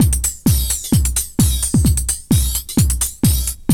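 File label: flange; AMG - Now CD-ROM (Roland); Megabass 2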